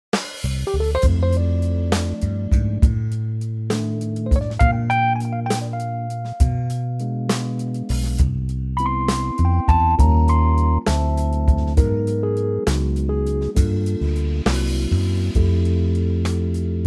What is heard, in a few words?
F smooth; female